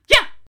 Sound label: rage; Hollow Knight